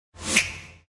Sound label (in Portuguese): estralo de dedo; toques de mensagens